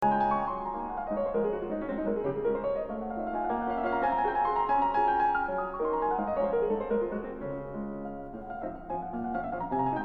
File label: Waltzes D Major